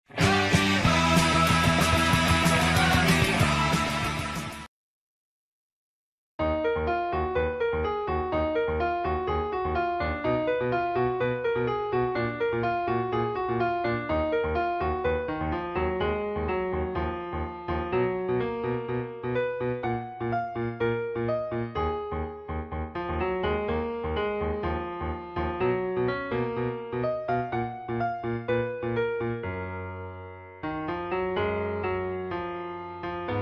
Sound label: easy piano tutorial; murder drones episode 8